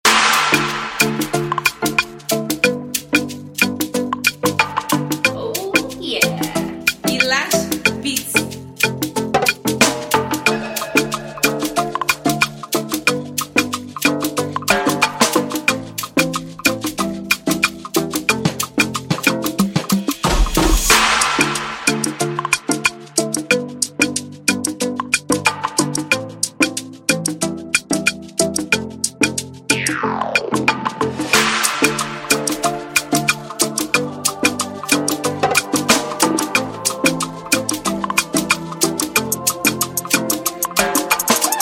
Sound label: Afrogroove Pop